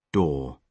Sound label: door